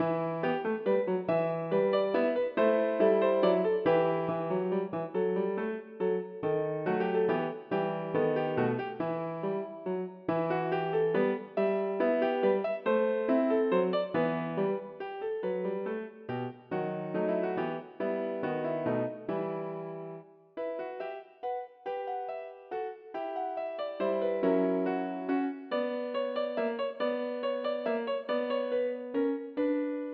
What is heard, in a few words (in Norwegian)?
Menuet for piano